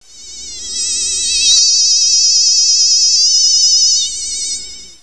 INSECT

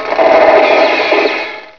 GUNS, war

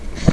PIECE UP

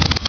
cards, clicks

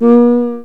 WHOO

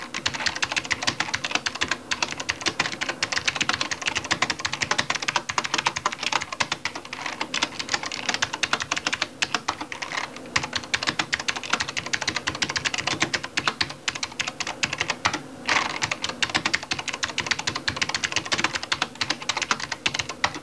KEYBO

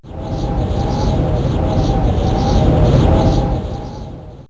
WINDY; ELEMENTS

